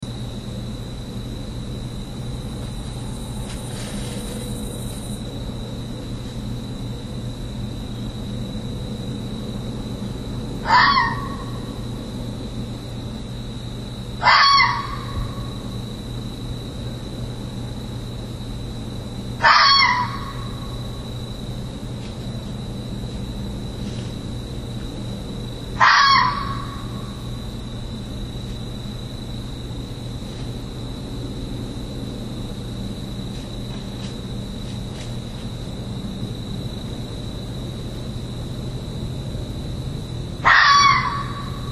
calls early am hours

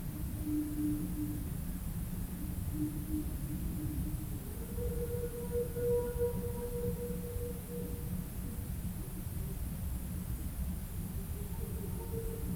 whoowhoo

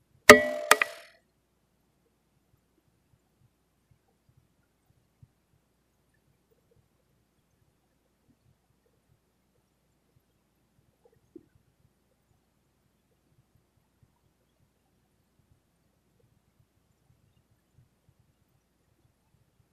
stonegrowl

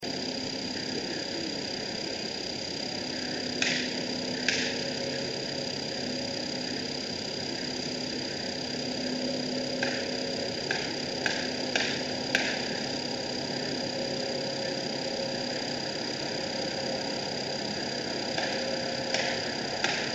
reply to wood knocks as car passes

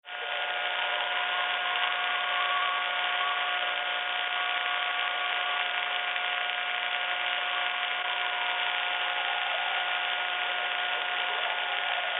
howl

